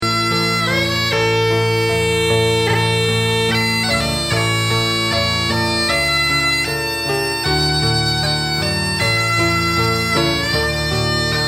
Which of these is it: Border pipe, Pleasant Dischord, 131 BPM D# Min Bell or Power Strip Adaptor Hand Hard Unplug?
Border pipe